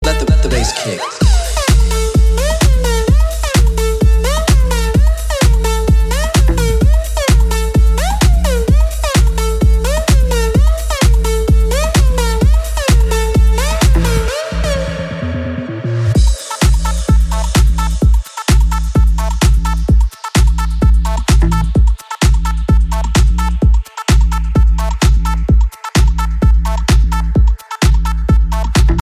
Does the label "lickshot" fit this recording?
No